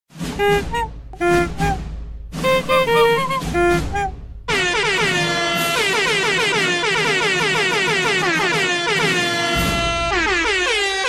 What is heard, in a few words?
honk